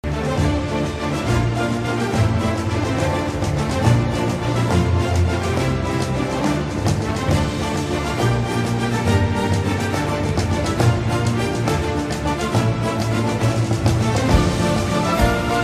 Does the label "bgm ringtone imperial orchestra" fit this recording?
Yes